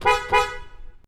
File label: horn